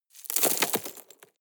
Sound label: drop rock debris medium short